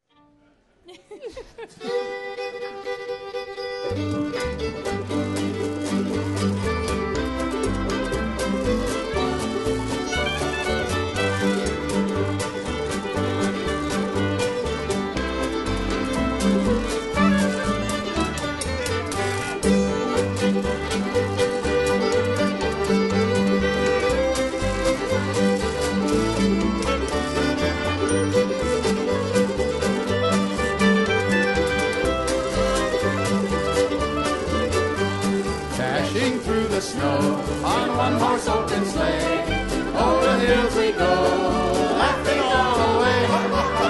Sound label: Jingle Bells